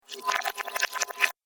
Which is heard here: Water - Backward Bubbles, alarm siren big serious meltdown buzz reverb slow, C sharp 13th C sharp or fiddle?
Water - Backward Bubbles